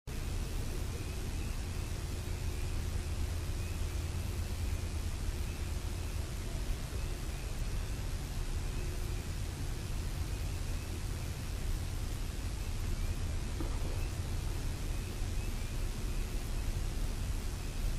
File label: wood knocks; 5 Knocks at 4:41AM February 10th